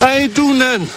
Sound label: loud sound warning; something about yoshi's island animated